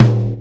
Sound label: Tom